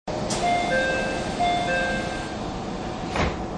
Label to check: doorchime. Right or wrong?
Right